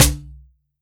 drum, mixed drums, World Instruments

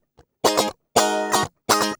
GUI 120 5bG#; Best Service Gigapack 2

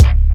KICK, Hip Hop

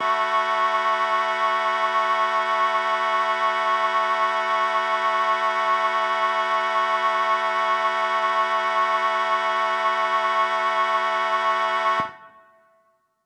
Mixed Winds A6:9, synth orchestra

